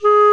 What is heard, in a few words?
Clarinet & Bass Clarinet